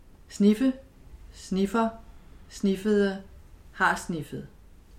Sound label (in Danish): sniffe